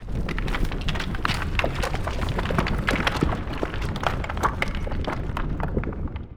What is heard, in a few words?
spin Start; netent